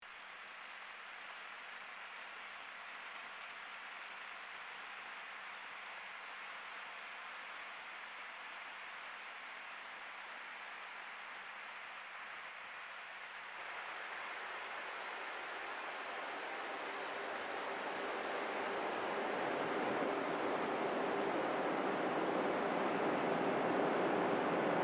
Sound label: Static on the Line